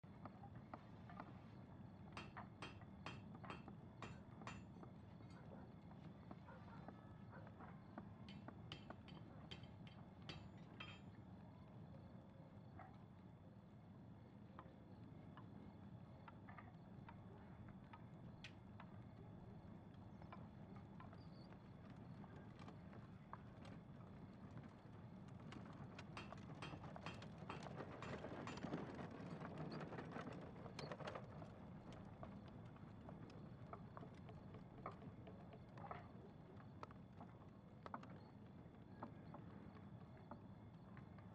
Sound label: amb beasthunters loop; ambient; everdawn